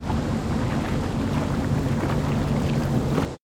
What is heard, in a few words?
houseboat